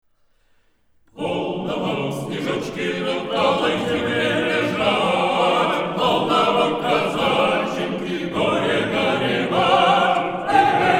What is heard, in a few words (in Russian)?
Хор